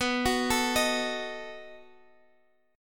B 7th B down; arpeggiated

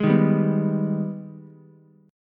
Ab Minor Major 7th, down, Ukulele; AbmM7; strummed

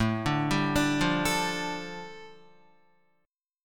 A 7th Suspended 4th Sharp 5th, A, down, Guitar; A7sus4#5 arpeggiated

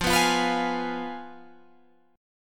F Diminished Guitar Open G

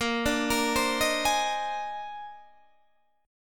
Bb 11th, Guitar, Open D

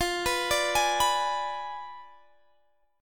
F Diminished 7th F down Guitar Drop D

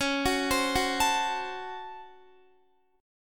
Db Major 7th Suspended 4th Sharp 5th, Guitar; x,16,18,17,18,17; 4F,4A,5C,5G,5C,6E; arpeggiated; chord shape

